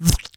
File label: Bullet Hit Player; liblast; Weapons